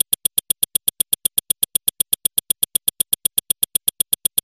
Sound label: Drink Side Timer; Food Truck Game